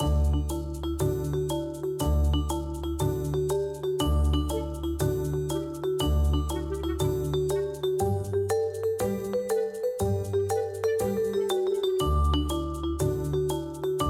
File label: Kids Blues